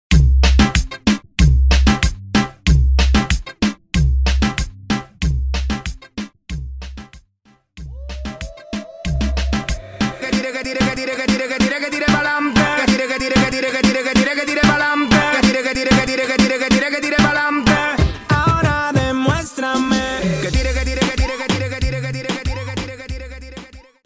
Intro Break Outro 94 BPM

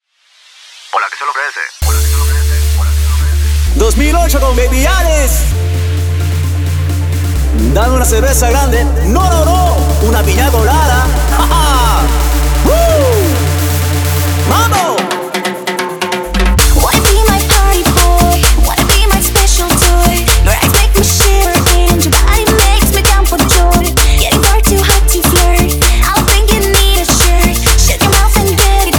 intro outro 130 bpm

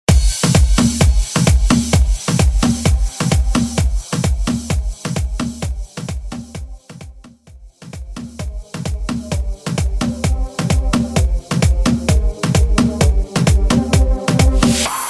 House Guaracha Remix 130 BPM

